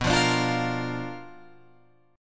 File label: B-Minor Major 7th Flat 5th B down Guitar Open F; BmM7b5 strummed; JGuitar